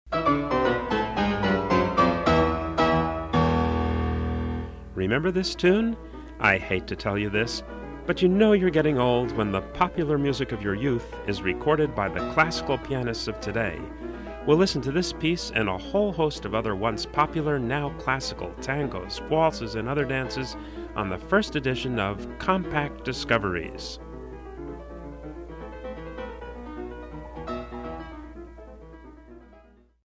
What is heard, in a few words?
brazilian beats promo